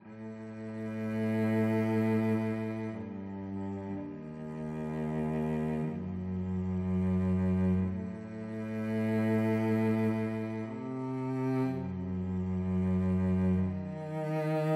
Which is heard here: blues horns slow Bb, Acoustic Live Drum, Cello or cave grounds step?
Cello